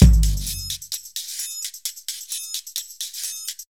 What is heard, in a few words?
car bomb